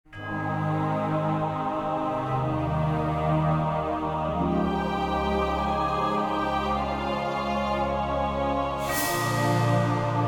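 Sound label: Humans Ambience; Disciples III Reincarnation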